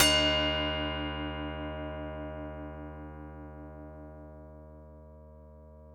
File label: ORIENT D2, ORIENTAL; Music Store; Yamaha VP-1